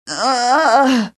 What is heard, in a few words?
DYING; BattleSNDS